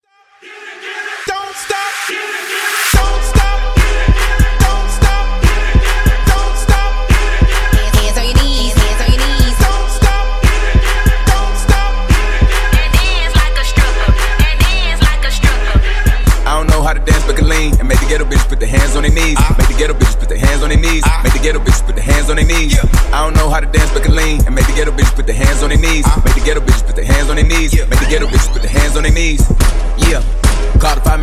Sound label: Acapella Intro Outro 72Bpm